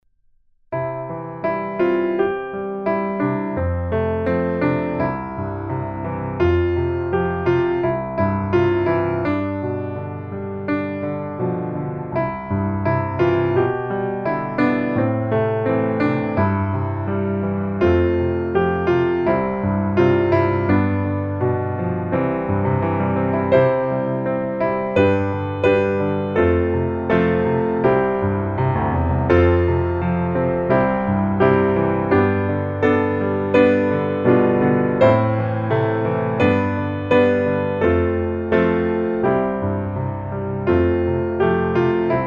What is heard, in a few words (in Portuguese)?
piano